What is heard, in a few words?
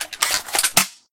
switch weapon